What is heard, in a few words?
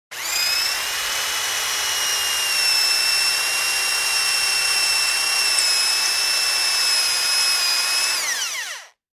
Electric drill bores holes in wood at variable speeds.; Tools, Hand Drill Motor, Drill